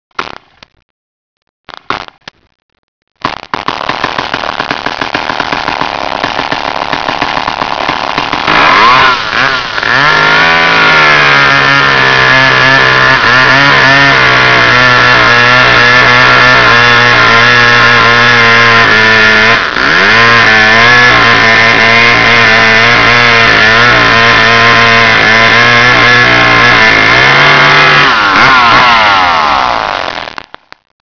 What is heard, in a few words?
chainsaw